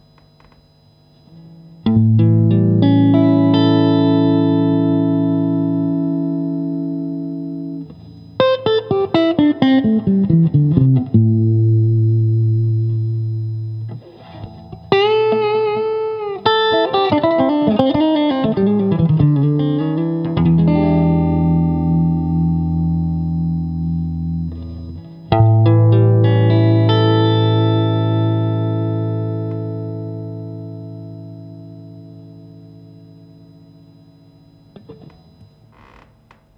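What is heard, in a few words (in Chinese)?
60S MWS 美产铜线 清音 3档, acoustic guitar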